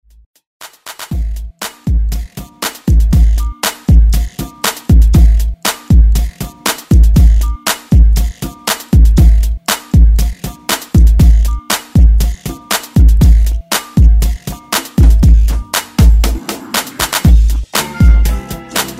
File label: 70s Ext Redrum